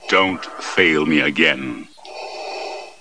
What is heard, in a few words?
lose